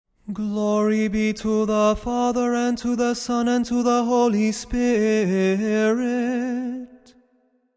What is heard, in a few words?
Tone 8 glory be